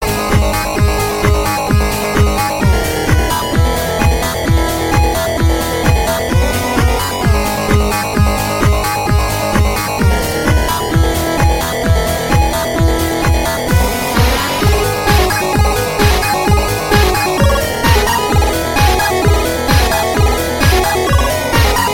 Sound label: Remix